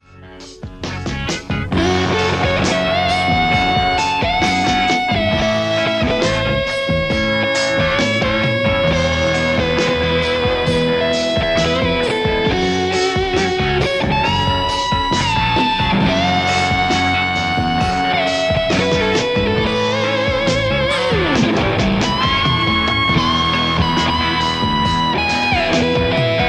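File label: Stratocaster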